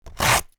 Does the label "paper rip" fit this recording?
Yes